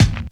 silky hip hop kick drum one shot g sharp key

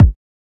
old school phat kick sound A key; kick drum samples

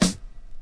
reverb pop snare drum sample f sharp key

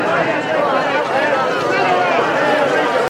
exclaiming and clamoring

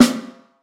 short reverb snare single hit b key

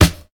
perfect snare one shot e key; snare drum samples

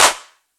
subtle reverb steel snare drum sample E key

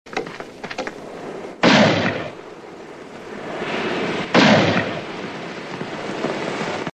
two gunshots

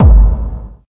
huge bass drum single shot g key

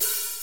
old school cymbal sample g sharp key

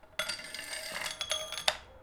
stone falling through metal pipe; metallic

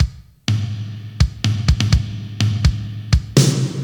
125 bpm breakbeat sample d sharp key

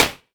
good clap sample d key; single hits one shots

